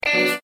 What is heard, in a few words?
guitar